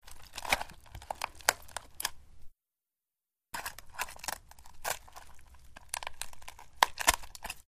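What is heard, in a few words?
Gouge Eye From Socket, gore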